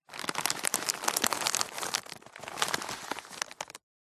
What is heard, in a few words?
food potato chips packet 100g movements handling gentle; zapsplat